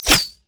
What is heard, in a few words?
thorn plant; Zyra; LoL